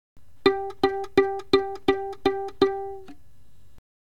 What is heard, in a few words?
Violin pizzicato